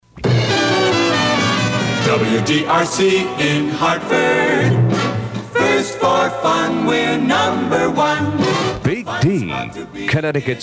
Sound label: jingle; Godfrey